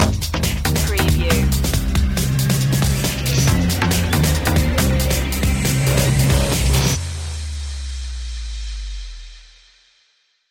thunder cat stinger

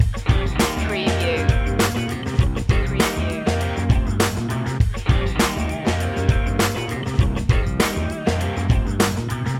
funkin yell loop

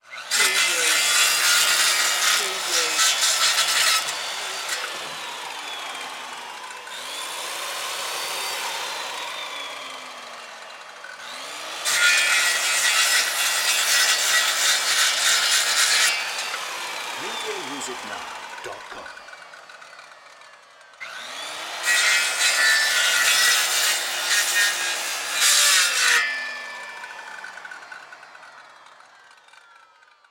Circular Saw Cut Wood

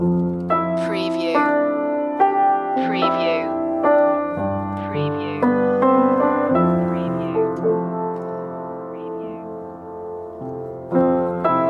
right here with you loop

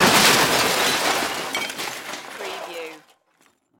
Crash Metal

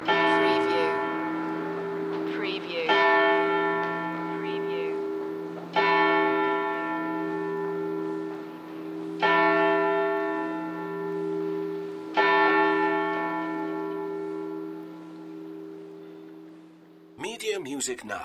Berne Clock Tower Traffic

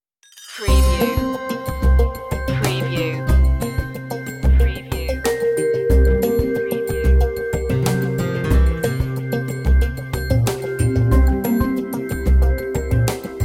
life style tv light comedy

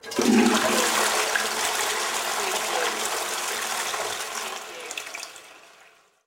Toilet Flush

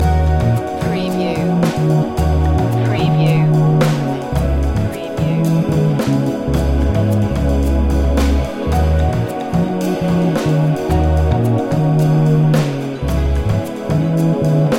successful start loop

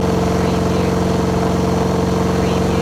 Chevy V8 Hot Rod Engine Loop

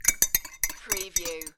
Stirring with a spoon in a cocktail glass

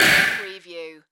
Button Electronic Percussion Hit; Space / Science Fiction (Sci-FI)

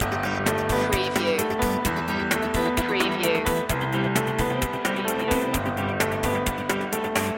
loop breakdown

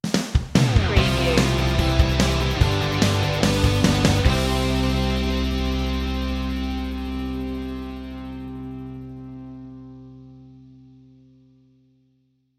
rockin stinger

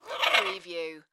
Bird Peacock Squawk; sound-effects-bible

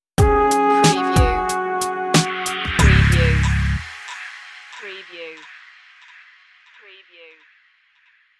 jazz stinger

